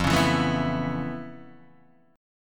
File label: A Major Add 9th F Guitar Standard, chord; Aadd9/F Chord {1 4 2 2 0 0} strummed